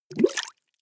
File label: bubbles hard